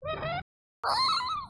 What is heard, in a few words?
groan